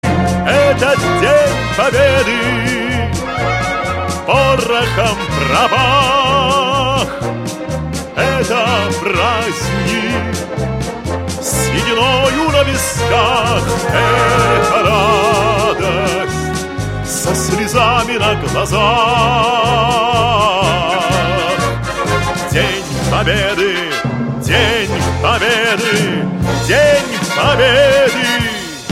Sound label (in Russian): Рингтон